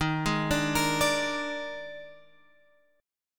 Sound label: D sharp Major 7th Suspended 4th, D sharp, down, Guitar, Open G